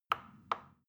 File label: plastic button tiny click soft slow